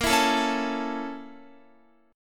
Bb Minor Major 7th Flat 5th, Guitar; strummed; 4E,4A,5D,5G,5C,6F

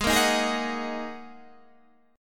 A sharp 7th Suspended 4th Sharp 5th G sharp

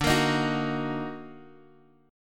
Eb Minor D; Ebm/D strummed; JGuitar

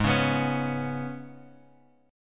G sharp Minor Double Flat 5th G sharp; {4 4 X 4 2 X} strummed

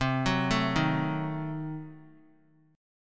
C Diminished 7th down; arpeggiated; JGuitar

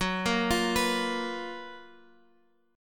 F sharp Major 11th Guitar Half Step Down

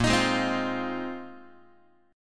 A sharp 9th Suspended 4th, Guitar; strummed; chord; JGuitar

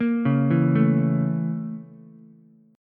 A sharp Minor Major 7th Flat 5th A sharp Ukulele; A#mM7b5 arpeggiated; JGuitar

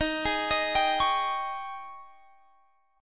G sharp Major 7th Suspended 4th, D sharp

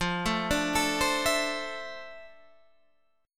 F Major 7th Add 6th, guitar chord

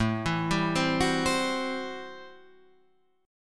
A Minor 13th A Guitar Half Step Down

